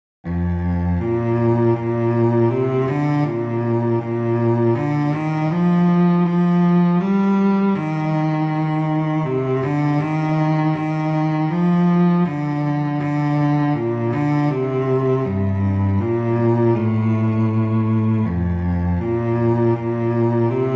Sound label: Away in a Manger; Christmas Beginners